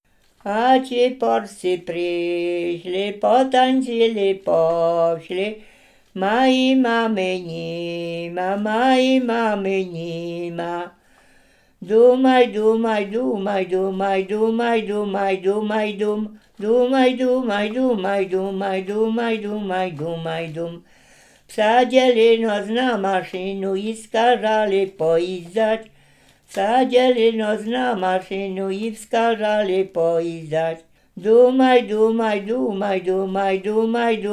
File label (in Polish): rosyjska piosenka